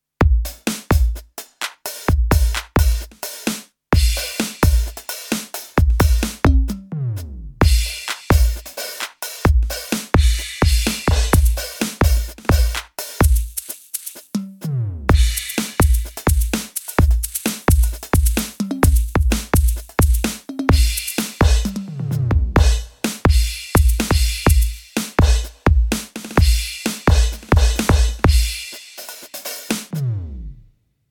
House; ROLAND TD-02KV

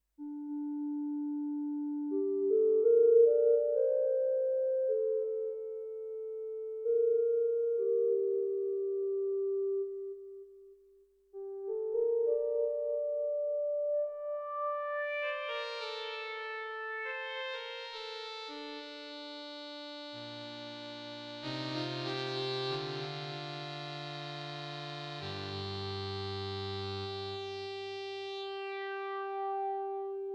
super8 dynamic Filter; UDO

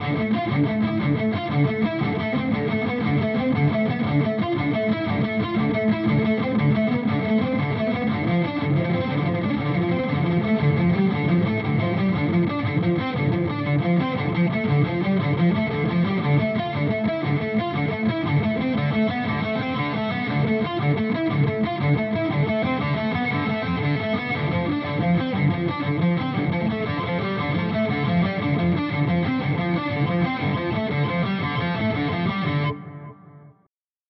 Amp Phat Gainer; Boss GT-1000 Core

